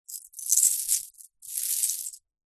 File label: animals dog leash metal chain place into hand; zapsplat